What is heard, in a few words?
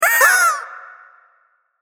correct; チキンのおもちゃでピンポン。その７; 正解の音・ピンポーン